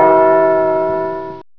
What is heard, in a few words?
Clock; Metric Clock